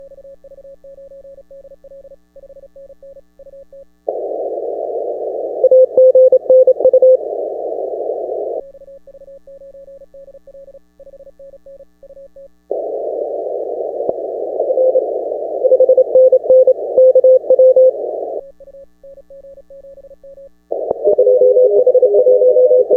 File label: ARRL DX CW 10M; Elecraft K4; Key - Unkey Pop